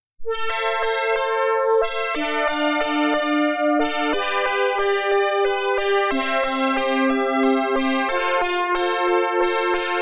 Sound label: Farandole Lamentoso